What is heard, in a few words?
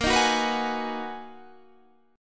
Ab Minor 7th; Abm7/Bb; strummed